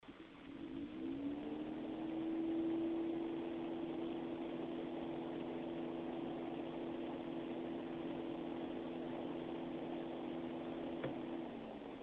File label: cnps9700 led low; the fan is rotating at 2800 rpm; full speed; frostytech acoustic sampling chamber